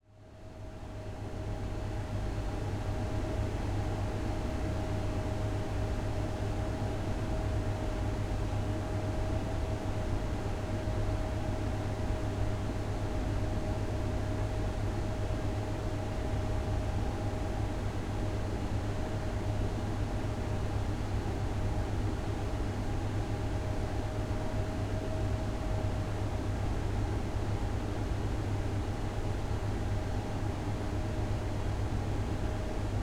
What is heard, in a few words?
Restaurant Bar Night Fridges Empty Room Tone; Neumann KM184 ORTF; Restaurant Ambiences